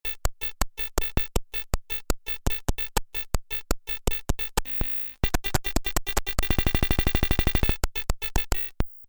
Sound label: Data Beat